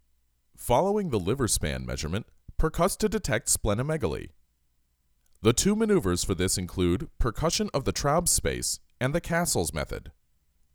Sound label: Percussion; Ab Percussion